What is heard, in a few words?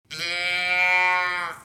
moo box